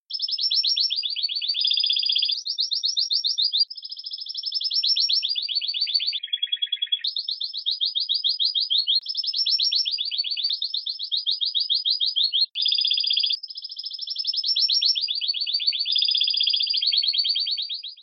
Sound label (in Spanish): Nota Batida, timbrado español; θετικές νότες